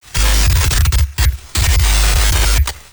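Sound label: Amp Stutter Glitch Pitch Shift; GlitchBlog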